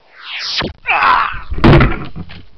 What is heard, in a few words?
arrow message shot